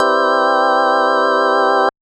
House Organ Chord; Big Fish Audio; OSH Chords

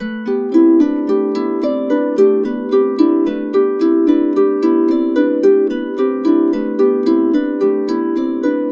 110, Complete Harps; USB Soundscan vol.62; AKAI

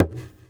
EQ Perc, Percussion Mix

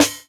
Snare, jungle breaks drum sounds; ORIGINAL JUNGLE BREAKS; JBK DRUM SOUNDS; JBK SNARES

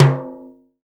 timbale lo, Yamaha Motif ES drum machine; 300 Drum Machines; Yamaha Motif ES-Series

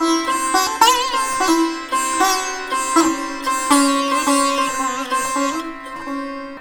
SITAR, Indian Traditions

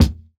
Kick, jungle breaks drum sounds

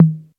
Roland U110 Elec Drums F1

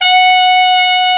BRA TENOR, SAX; Best Service Gigapack 1; BR SAX 2

